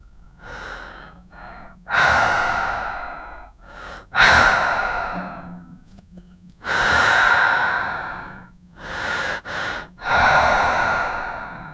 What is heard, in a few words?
18 years old woman breath